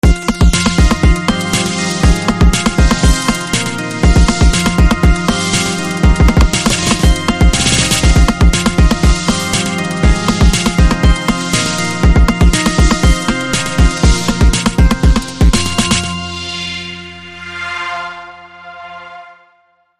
Eastern Techno; Eastern Techno 1

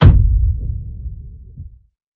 Hit Low Hard Punch

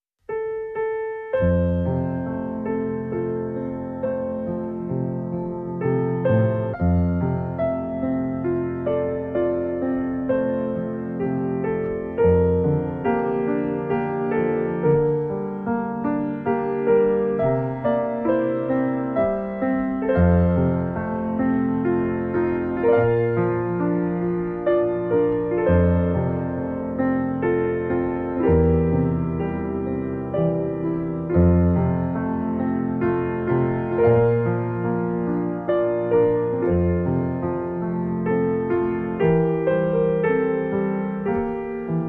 Piano Solo

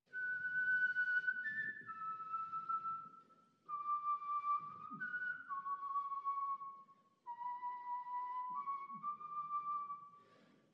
Trumpets

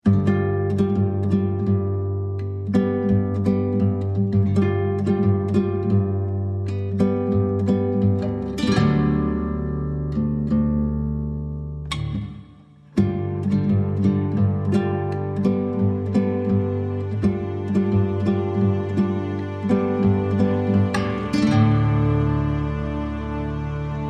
Latin Lounge, No Woodwinds

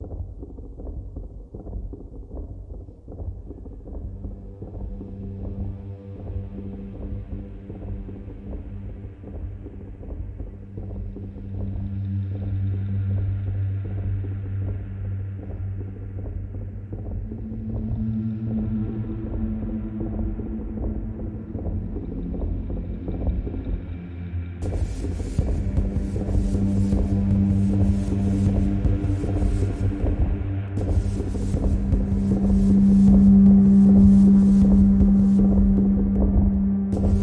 Guitar Percussions Only

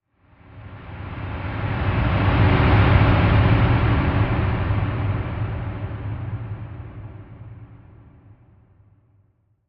Hushed Traveler Ocean Whoosh

